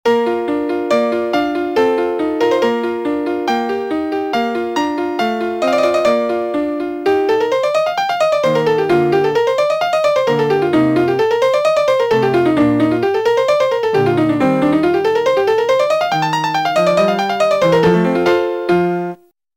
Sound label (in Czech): melodie